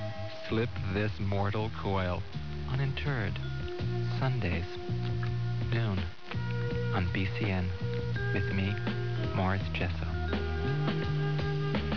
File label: coil